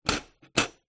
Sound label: household metal hob ring movement on glass stove